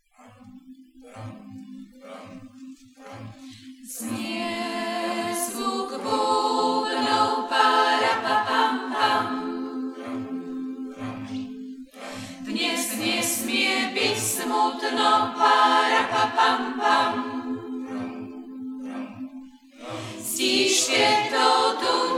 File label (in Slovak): zvuk bubnov